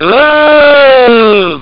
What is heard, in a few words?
oughhh; Amiga Soundtracker Sample Packs